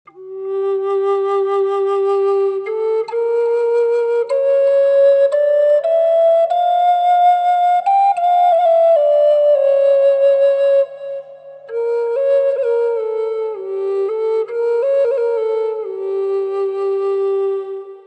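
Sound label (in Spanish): hiyaz G, Flauta Nativa, Roble; flauta de medicina; varias tonalidades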